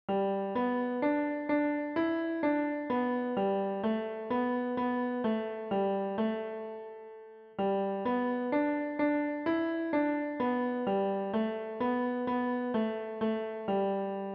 Klavier

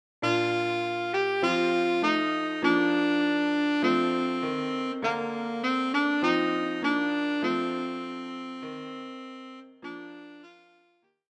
Altsaxophon; für Altsaxophon und Klavier, Schwierigkeitsgrad Klavier Level 3, leicht; Alle Jahre wieder

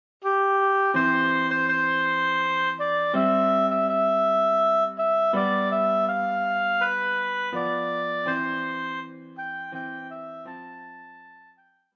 O Tannenbaum Oboe, für Oboe und Klavier; Schwierigkeitsgrad Klavier Level 2, leicht

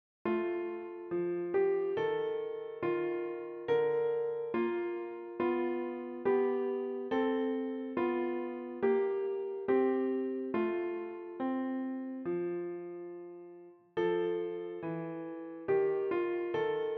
Klavier; Swanee River - für Klavier; amerikanisches Lied für Klavier, inkl. Fingersätze, mit Vorspiel

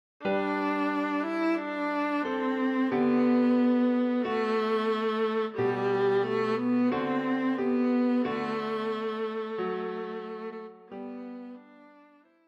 Bratsche; für Bratsche und Klavier, Schwierigkeitsgrad Klavier Level 2, leicht; Alle Jahre wieder

